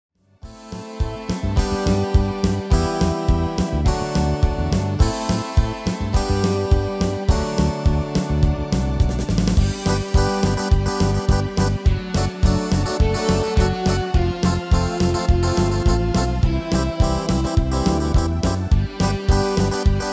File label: MIDI